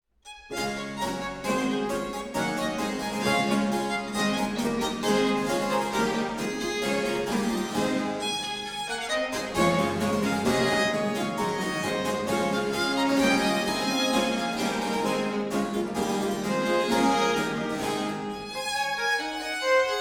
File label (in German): Barockorchester Presto; Coelner Barockorchester